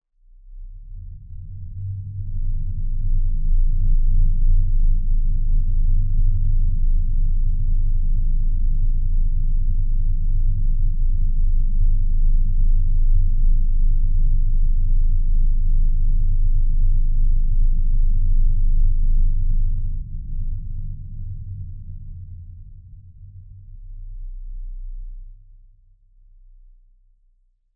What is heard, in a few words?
sound design lfe rumble air movement very low pitched med